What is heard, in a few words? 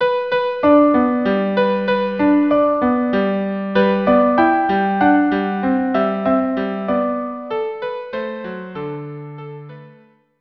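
viola duo; brahms lullaby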